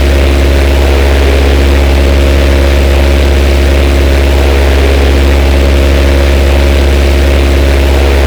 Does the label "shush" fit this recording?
No